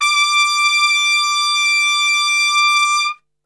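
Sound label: D 4 piccolo trumpet, Brass; Best Service; Brass Super Section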